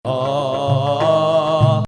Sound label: Ajam; Ajam, which resembles the Western Major scale, has two distinct versions